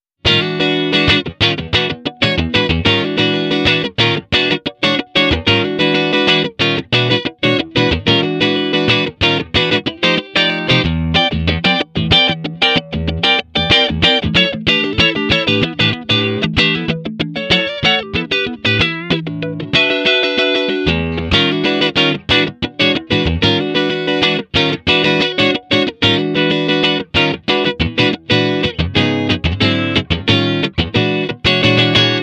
blues mode1

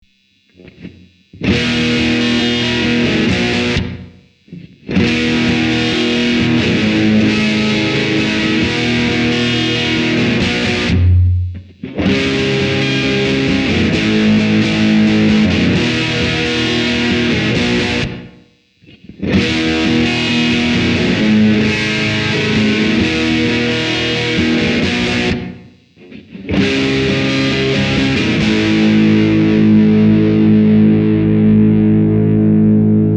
ac30 with reverb enhanced

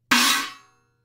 choke crash

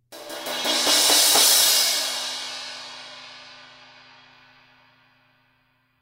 buildcrash

